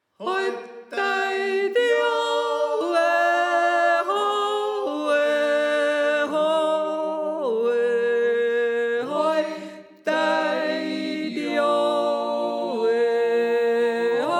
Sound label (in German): Sopran